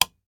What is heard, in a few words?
switch